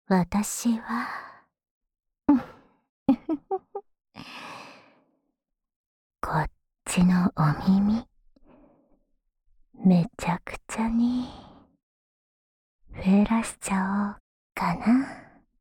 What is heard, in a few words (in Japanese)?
妖艶 喉奥右耳フェラ; フェラ素材